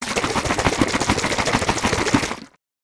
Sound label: Cooking Game Shaking Liquid; Epic Stock Media